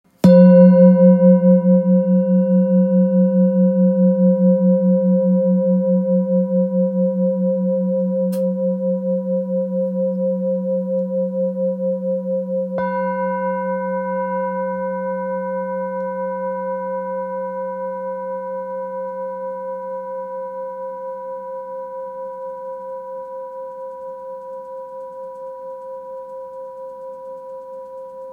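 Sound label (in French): Bol fullmoon do, bols chantants tibétains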